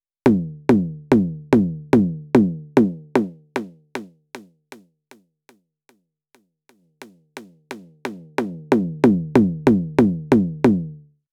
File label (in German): Elektron Digitakt Highpassfilter